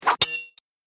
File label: Sword Slash Hits Metal Armour; Battle Sound Effects; Andrew Fleming